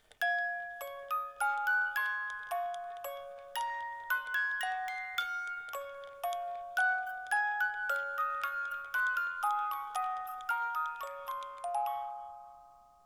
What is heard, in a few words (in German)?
Menuett